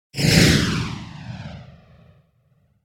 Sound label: Whoosh Firing Phased; Hollywood Edge; sound designer's toolkit v2